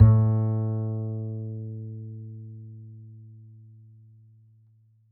D string upright bass; Upright Acoustic Bass

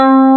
RHODES G2; AKAI S-Series CD-ROM Sound Library VOL-8

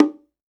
Percussion, House

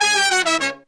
96BPM, Horns

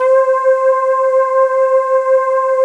digi pad, Pads; Best Service; XXL 800 Pads; Sample CDs

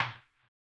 HAMBONE; ILIO; Vocal Planet; Blues & Soul

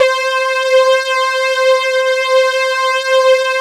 JV-1080

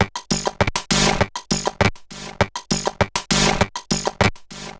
Bent RX17 (100BPM), cyborg beats; musicradar

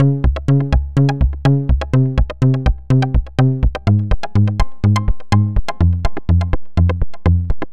Synth Sequence; Eclectic Electronica

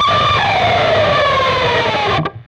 PRS SCRAP, Guitars; PRS SCRAPES

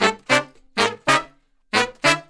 Horn Section

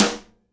SIDE STICK, Live Snares

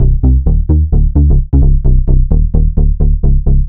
Bass 130C, uk garage 130bpm; Zona

